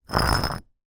foley brick short drag on hard ground; zapsplat

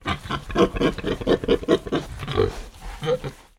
pig tamworth grunt pleasure being scratched itchy

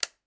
household switch light flick; sound effects one

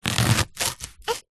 leek slice knife on wooden chopping board; food

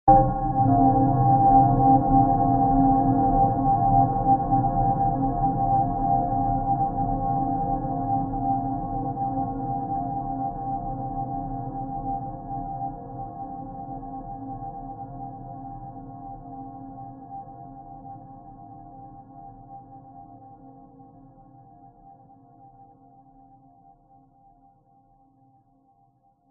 sound design hit deep dark haunting

